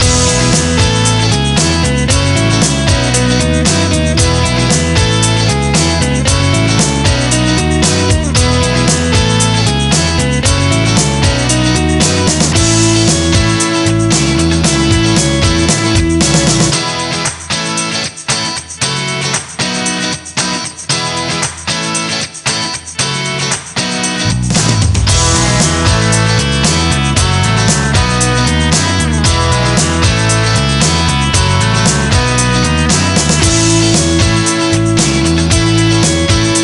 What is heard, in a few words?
Music Dance Loop; game rip; littlest pet shop garden jungle winter; nds